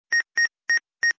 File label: Security Beeps